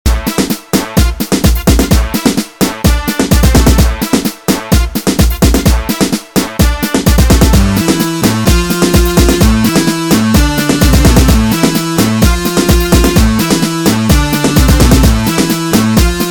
hareketli tulum, korg pa 80

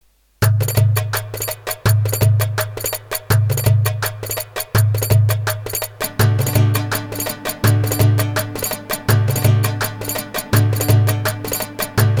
ada cift, roland gw 8 ritim

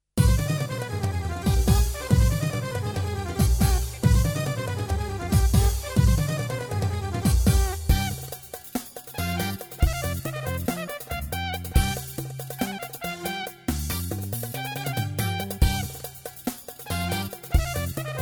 super 9 8 lik roman oyunu, pa500 tr

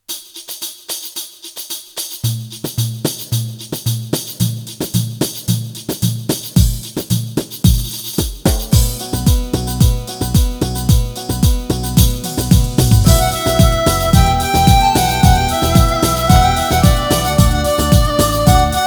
ilahi kervan, a300 tr v2; medineye giden

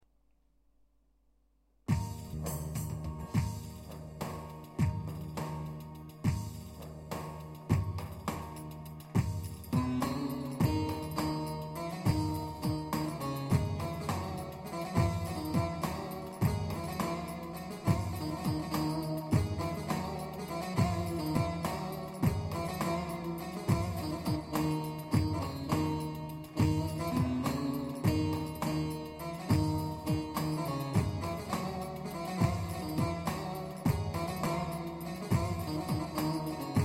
pa-500 tr 5/8 ritim, yayli keman

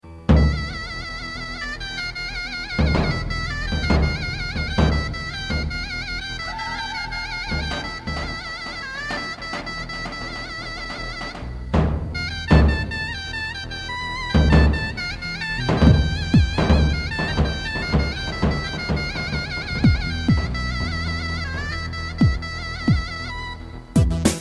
pa-55 halay; 2011 set ankara oyun havalari

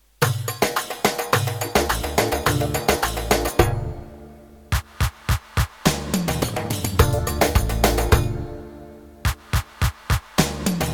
damat, roland gw 8 ritim

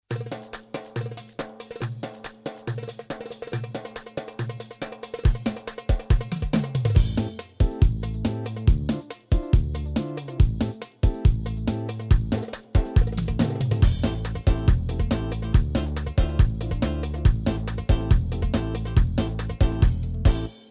oyun 4-4, yamaha psr a300tr v2 ritm

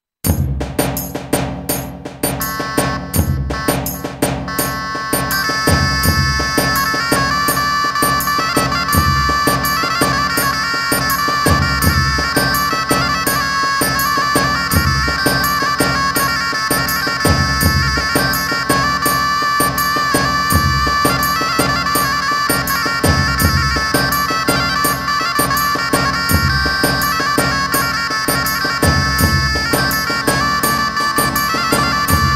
zurla, gw 8 ritim ve ses

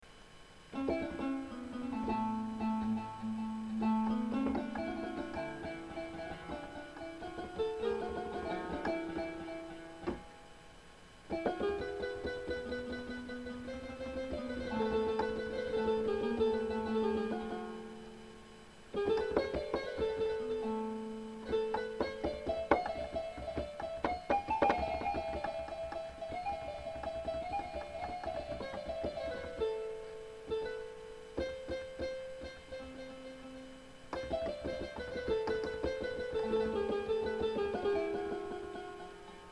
a300tr; ilk demom

